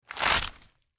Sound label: Breaking Tearing; punches kicks hits bones